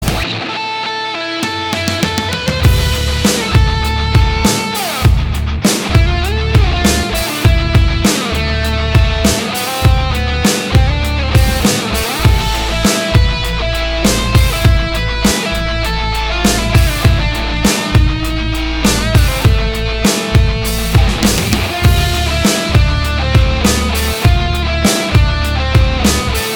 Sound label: guitar